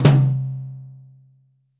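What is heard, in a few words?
New Jazz Tom Mid Flam, Roland BK-5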